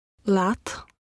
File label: Key Sounds